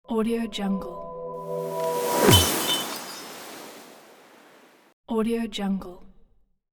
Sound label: transition downward sweep dreamy crash debris; transitions and movement; آوارهای تصادف